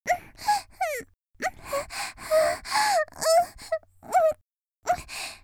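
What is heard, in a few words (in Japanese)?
（少女）「喘ぎ」声を潜める, 元気な少女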